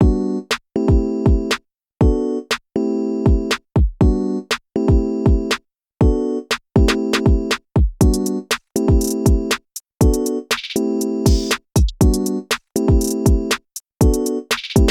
Rag, Instrumental; Friday Night Funkin' Shannon Sundays original songs

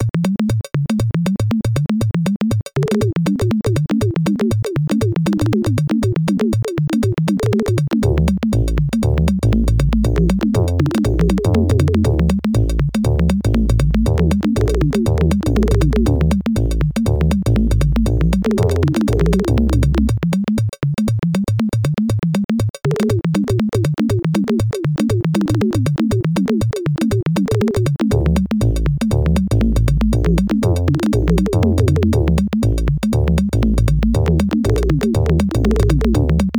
Jungle (Short Loop); Jungle Pink

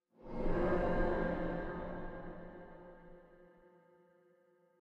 Hotel Whisper; silent hill homecoming 2008 gamerip pc ps3 x360

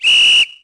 Referee Whistle; Sports

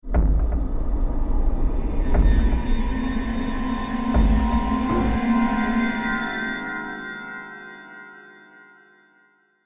Stinger Decrease Action

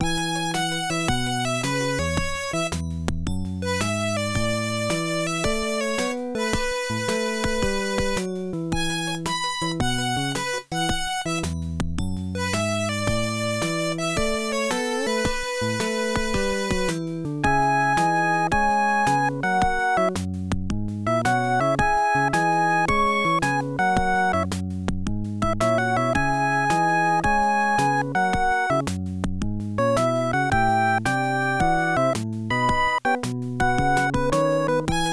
Jazz; Aerobiz Jazz; Air Management; Genesis

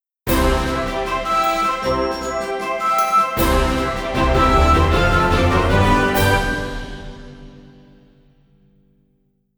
Fanfare; arc rise fantasia original soundtrack; Copin Fanfare

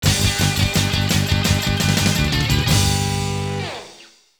Goal; aqua jet namco system super 22 arcade gamerip 1996

